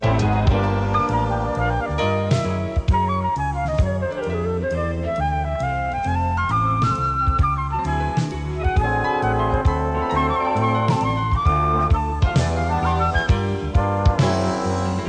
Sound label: muzak loop